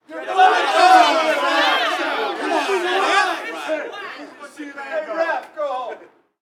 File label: street ball crowd boo; Crowds